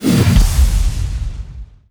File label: shockwave cast; weapons; dota; magnataur